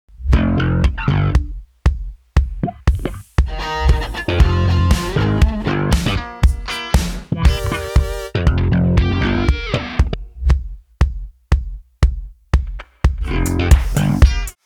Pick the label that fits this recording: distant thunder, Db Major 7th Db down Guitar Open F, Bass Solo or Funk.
Funk